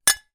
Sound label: impacts glass chink metal object strike